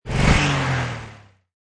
Rev Short, cars; Mater

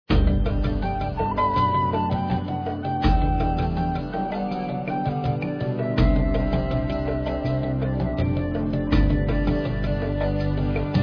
song loop; simbas pride

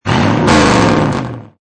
Rev Short, cars; disney; Ramone

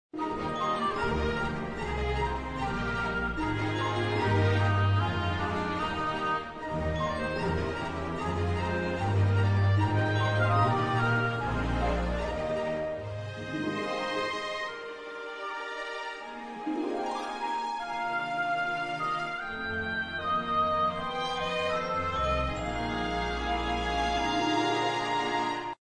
menu; fairies

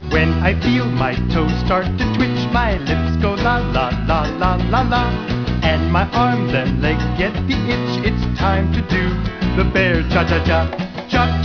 Cha Cha Cha; bear

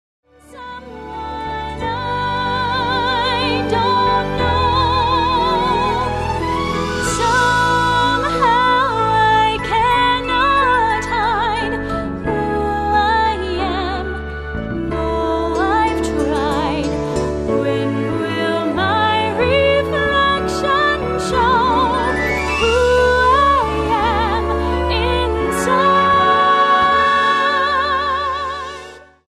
karaoke vocal; princess; disney

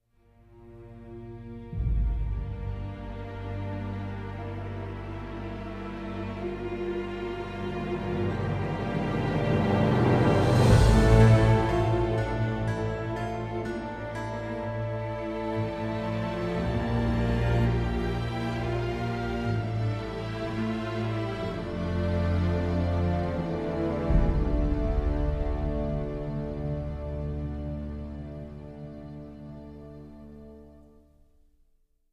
music; pirates; at worlds end; flagsmith

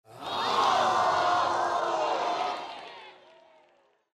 boos; think fast

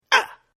male hit; holidays; snowbrawl

